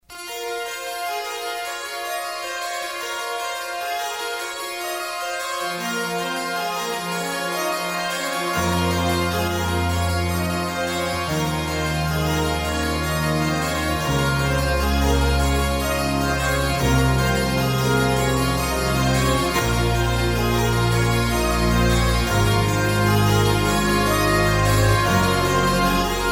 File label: horror tone ringtone; exorcist